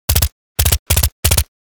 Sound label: submachine gun two three round bursts; Engineer